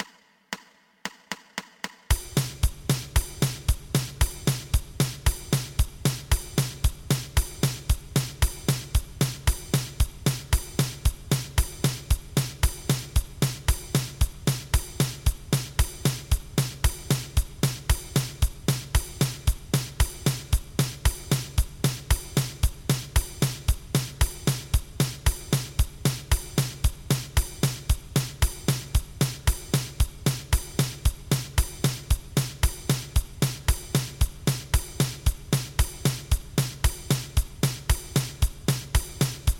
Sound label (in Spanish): 2 pulsos